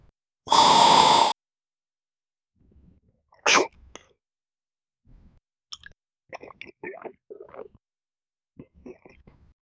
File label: drink coca cola slipping